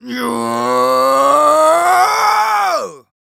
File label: Yells, Viking Vocal